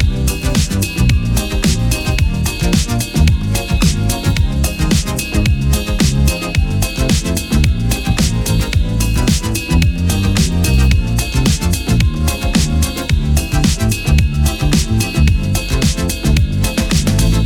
cash stack music; netent

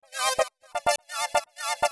music loop 1 bar build up low intensity; netent

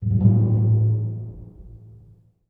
spin start; netent

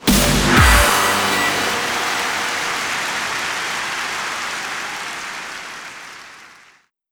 medium Win; netent

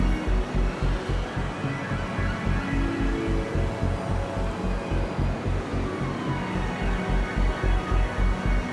freespin Outro Loop; netent

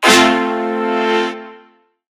scatter notes; netent

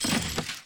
reel nudge; netent

